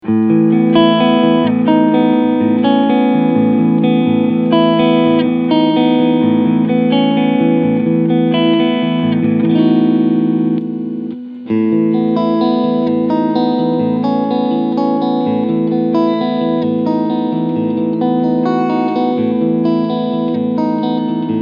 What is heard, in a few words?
Strandberg Boden Fusion Cleansound Position 1 5